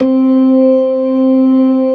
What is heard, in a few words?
ORGAN, LOWER MANUAL